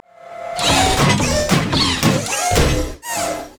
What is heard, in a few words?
emote dance; LoL; blitzcrank